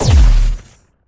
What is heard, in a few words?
Sci-Fi Weapons-Assault Rifle-Single Shot